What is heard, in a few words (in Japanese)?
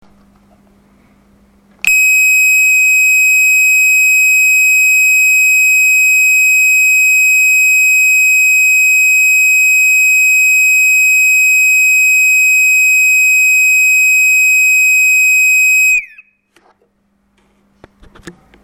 ESZ-27 連続音